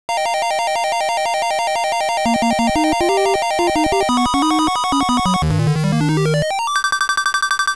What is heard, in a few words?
high score